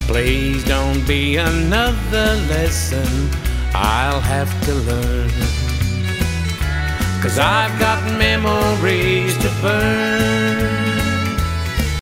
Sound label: swing